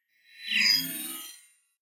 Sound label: swell short metal string computer; sweeps-swells